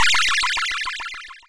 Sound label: scramble; Oryx